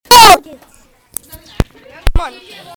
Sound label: bleep